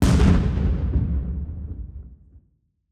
Howitzer, M101, C3, 105 mm, Medium Distant, Right Side, Shot, Firm, Heavy, Soft Attack; Airborne Sound; Battlefield Howitzers